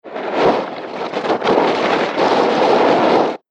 Horror Movement Crumbling Crypt Sounds; Scary Soundscape; Andrew Fleming

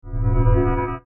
Logo Warble Swell; Warble Sound Effects; Production Element Imaging Element Accent Transition

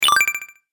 Game App Extra Life, Video Game Sound Effects

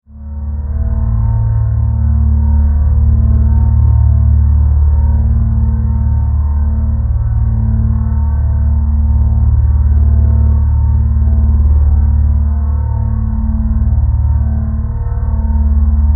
Drone, Energy, Source, Flutter, Scifi; 5.1

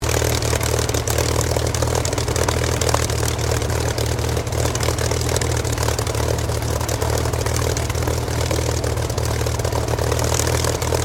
AIRCRAFT SUPERMARINE SPITFIRE MK-XVI IDLE; Airplanes (Sound Effects:Vehicles)

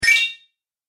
Message Alert, beep

